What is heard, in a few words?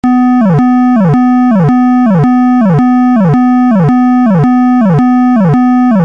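Siren Tone POWERCALL (RUMBLER)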